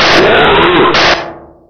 zombie attack

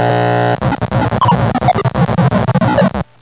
glitch

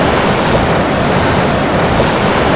wave loop

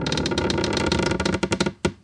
camp, ambience

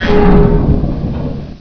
pipe burst

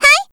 jump; m4mod

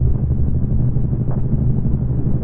ggun hold

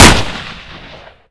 bar shoot

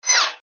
zoom out

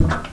ammo gas

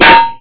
cleaver hit wall, weapons

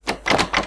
cupboard locked

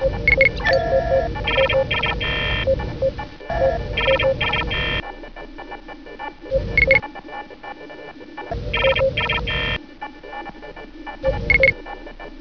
computer, ambience

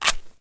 flare strike

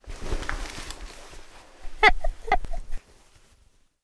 draw admire, weapons

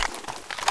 desert eagle draw, weapons

